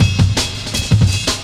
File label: Samurai Cut, Drum Loops, 165.5, Jungle; Zero-G Jungle Warfare 1, 2 & 3